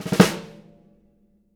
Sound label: snare ruffs, JZ XSNARES